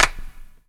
CLAP, Dance Drums

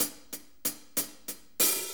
6-8 HiHats 60, HiHat & Ride Loops

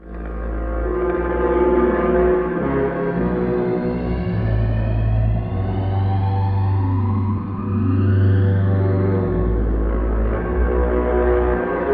Drama Tunnel A2; Zero-G - Ghost In The Machine

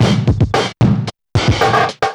HARDCORE, PHUZZ BEATS; Spectrasonic Distorted Reality 1

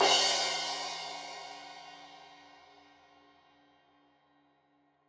Crash 15in L edge, Cymbals Stereo

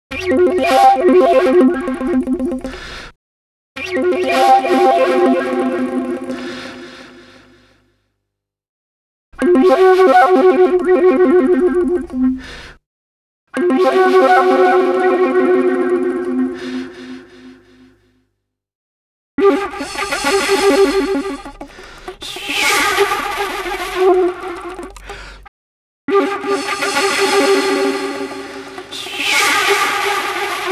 breaks, Jungle; Zero-G Jungle Frenzy 2

